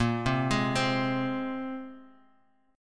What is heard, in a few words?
Bb 7th Suspended 2nd Bb down Guitar Standard; Bb7sus2 arpeggiated; JGuitar